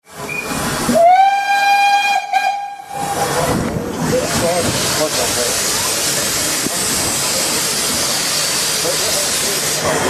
dampafgang

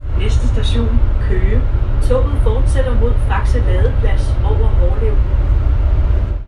lokaltog; Roskilde; Næste station: "Køge". Toget fortsætter mod Fakse Ladeplads over Hårlev; Kevin Hyllested, Marts 2022, Lokaltog

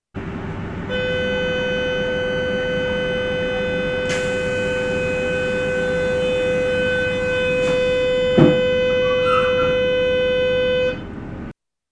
ic3 afgang, toglyde

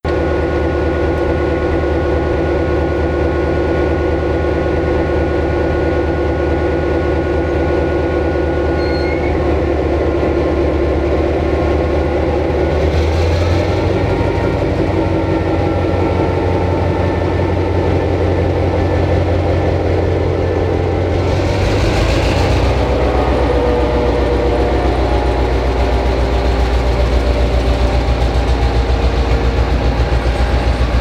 Contecrail MY 1153 Tomgang + igangsætning; MY tomgang og igangsætning; Jacob Andersen, 19. oktober 2019

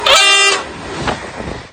tyfon

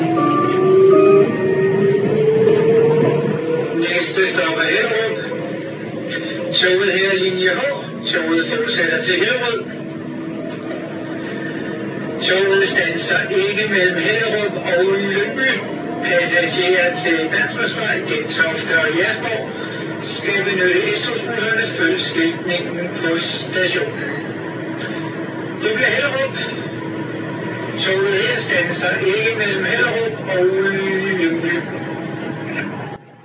s-tog hellerup; Hellerup (manuelt udkald); DSB Jingle. Næste station er Hellerup. Toget her er linje H. Toget fortsætter til Hillerød. Toget standser ikke imellem Hellerup og Lyngby.; 4. generations S-tog

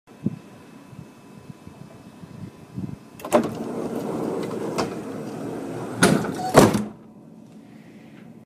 stog door luk

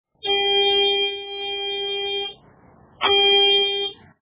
koer brum; Lokofører - afgangsbrum; Lokoføreren får et signal fra togføreren (et langt brum efterfulgt af et kort) når han må køre (MF/ER/ET).; Peter Ronge

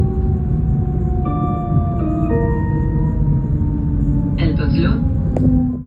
albertslund dsb jingle

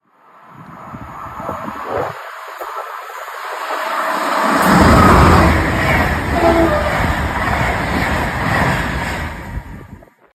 eb tyfon, Litra EB Eurocity forbikørsel og tyfon; 2024, Marslev; Otto von Gehren

